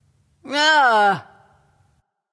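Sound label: ugh, female; smite; voicepack; hera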